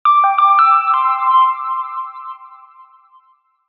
multimedia musical branding signature logo synth keys chime